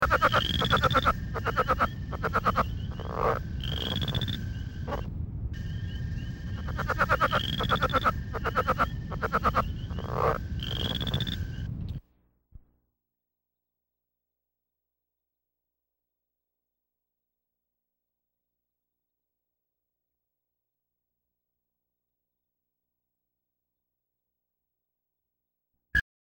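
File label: toads Southern Leopard Frog; wildlife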